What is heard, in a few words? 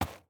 sand, walking; factorio 2.0.55